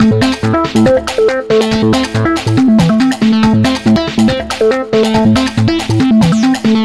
technobeat ringtone; android

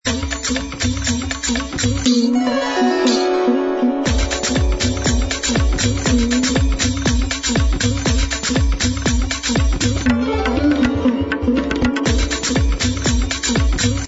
sharp edge ringtone; motorola

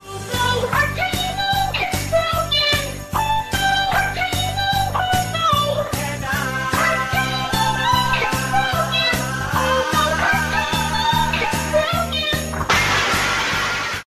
christmas ringtone

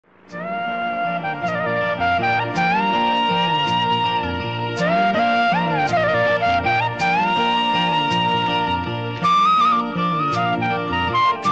indian classical flute; android

